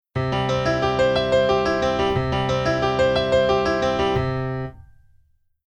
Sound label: Keyboard